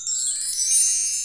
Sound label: heal; gates